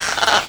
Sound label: birdy; gesource; creatures